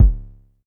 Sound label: Polite kicks long C#1; LIMAT Div Dept; Drums